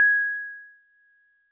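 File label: DigiPianet DX100 G sharp 6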